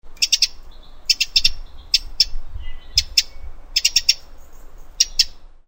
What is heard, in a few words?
wren